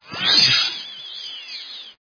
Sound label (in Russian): svist proletayushego predmeta